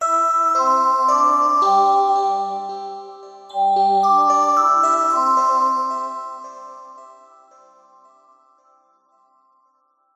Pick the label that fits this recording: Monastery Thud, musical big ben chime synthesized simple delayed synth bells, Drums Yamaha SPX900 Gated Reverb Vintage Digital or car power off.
musical big ben chime synthesized simple delayed synth bells